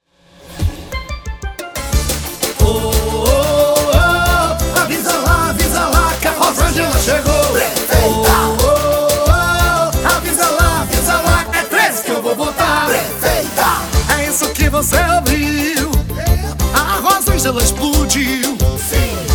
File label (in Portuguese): Jingle campanha